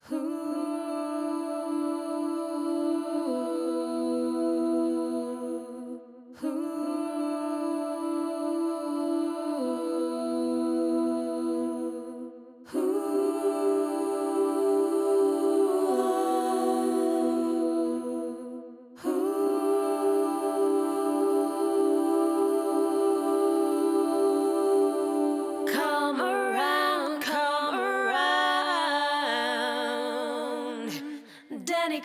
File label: processed bvs